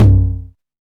Small Reverb Tom Sound F# Key, Loudest frequency: 157H; tom drum samples